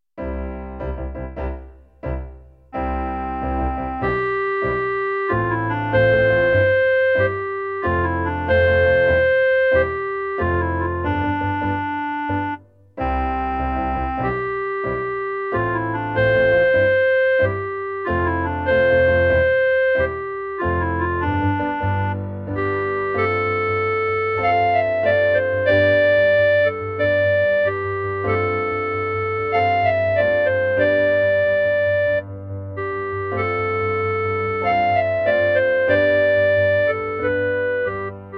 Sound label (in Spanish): CLARINETE